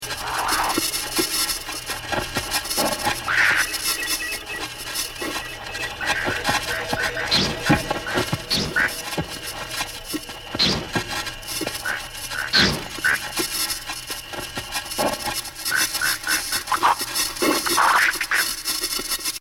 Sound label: Frog